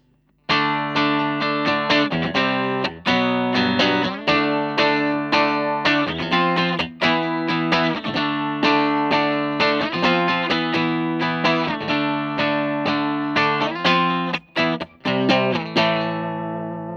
stratocaster; fender new standard guitars

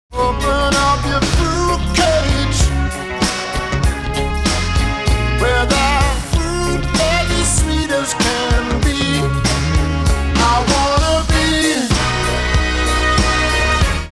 UVI Workstation pitch shift -2

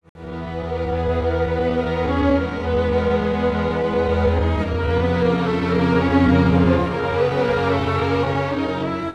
strings; pitchshift; i3 dsp quattro x

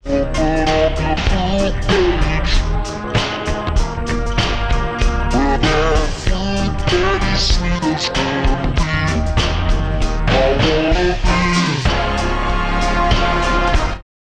Digidesign Pro Tools pitch shift -12

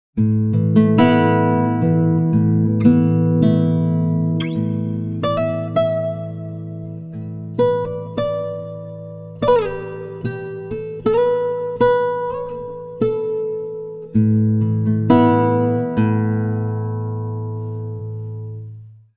guitar, timestretch; digidesign pro tools xform

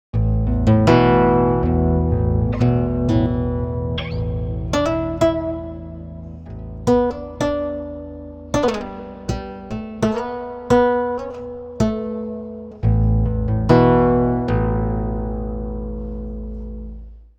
pitchshift guitar; celemony melodyne

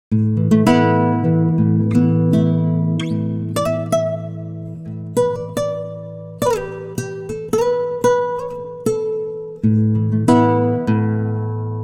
timestretch guitar; apple logic pro complex

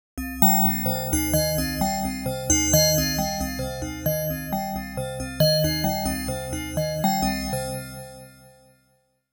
collision bells; ableton live8

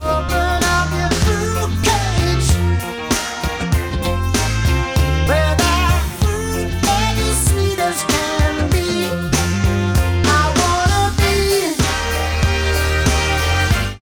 Celemony Melodyne pitch shift

